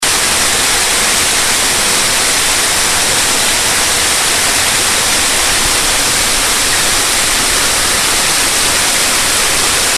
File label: Spruce Brook Falls